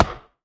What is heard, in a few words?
axe mining tree; yumako; Factorio